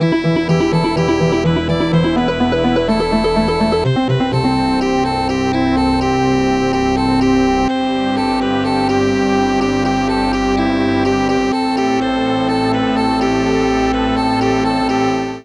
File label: hope jingle